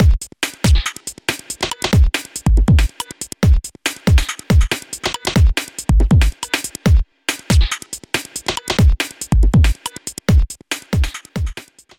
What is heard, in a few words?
beat wet stereo; fabfilter pro-ds